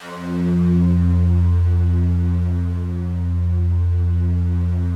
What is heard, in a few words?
FOGATK2 F1, Yamaha VP-1; Music Store; 90's Sample CDs; FOGATTACK 2